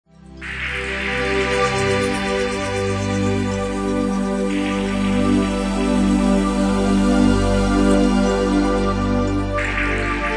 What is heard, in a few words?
new age synth